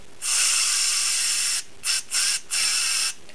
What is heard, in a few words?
aerosol